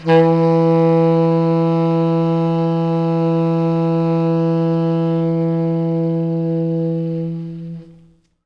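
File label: T Sax, tenor sax; B. Webster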